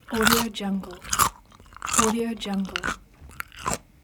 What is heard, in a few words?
chewing